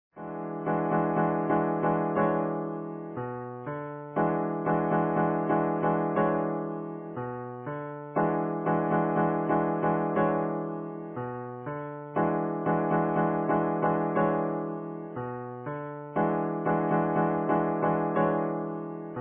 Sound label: funky